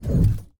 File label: Hand Paddle Release Med